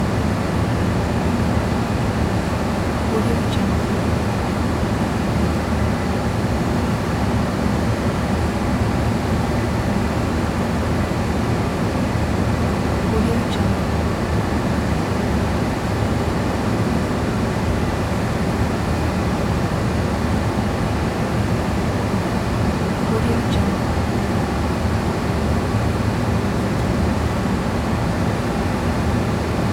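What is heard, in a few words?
ambience factory; prosoundfx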